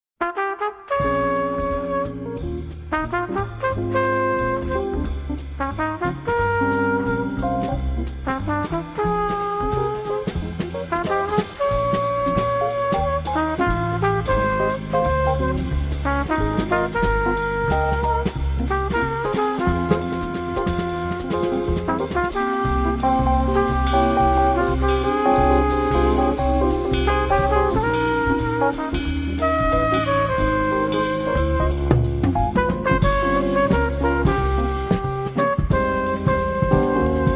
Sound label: trumpet & sax